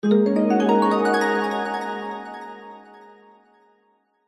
fantasy magic glissando warm harp mallet bright ascending; zapsplat